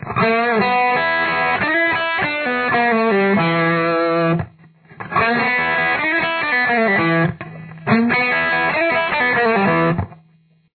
detail, guitar

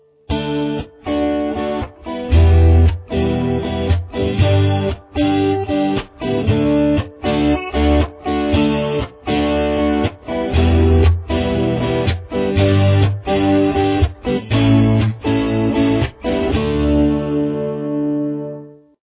D major, guitar

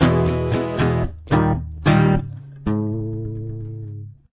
prechorus, guitar

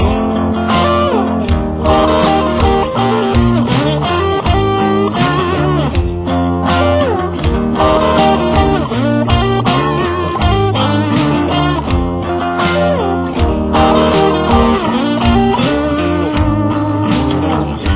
solo complete, guitar

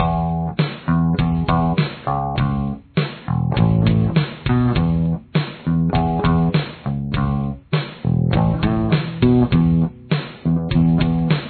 bass verse

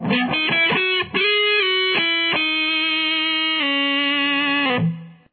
lick, guitar

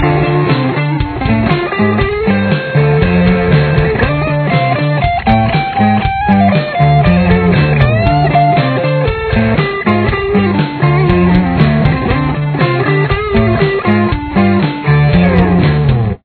solo

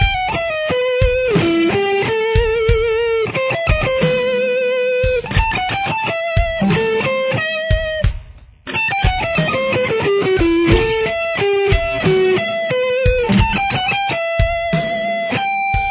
lead, guitar

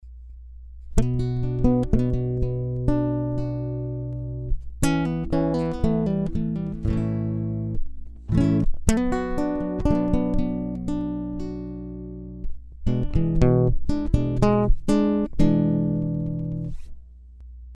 lead, fingerstyle guitar; Guitar 2; accompany; Bm, A, Bm, E7

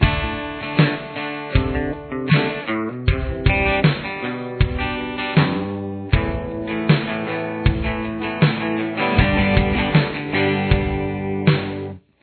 chorus after

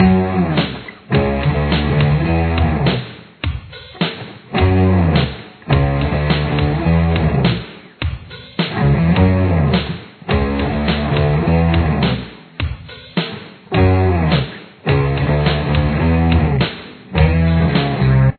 chorus, guitar

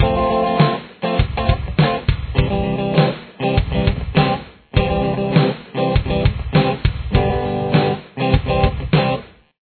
rhythm

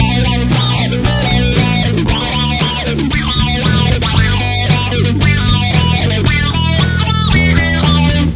solo

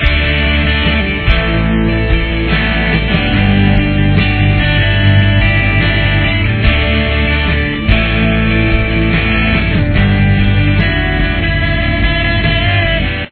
chorus

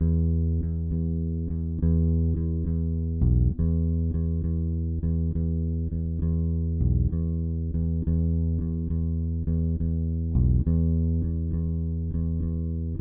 bass

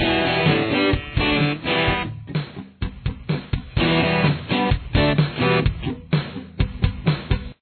acoustic, guitar

